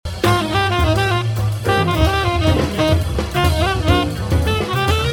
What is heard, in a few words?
5 note cell